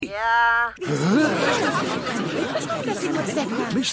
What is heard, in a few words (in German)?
Menge; Futurama; Rosemary